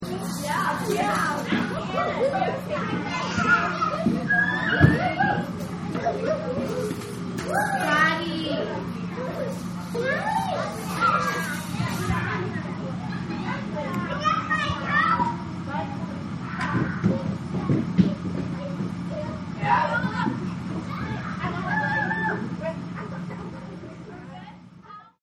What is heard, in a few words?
Kids party night, Ambience Recording Of Kids Having Fun At An Outdoor Party At Night; Bars